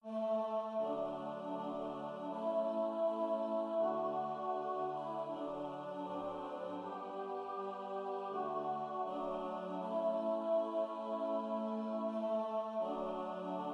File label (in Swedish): Visa fr Grundsund